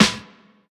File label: airy acoustic snare sound g sharp key